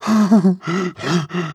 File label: breath long, zombie; creatures